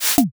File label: arrow hit